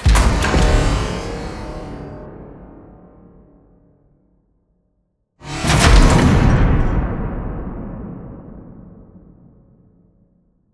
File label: Giant Floor Panel Assemble Final Lift Mech; Boomtown; Sideways Town; Titanfall 2